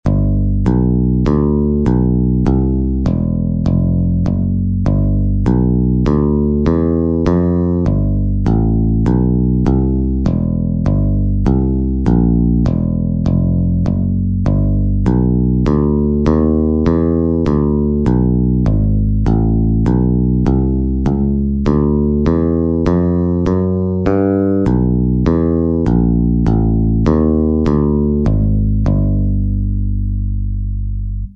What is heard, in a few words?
Bass figura do blues